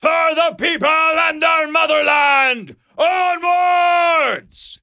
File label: charge; blugoslavia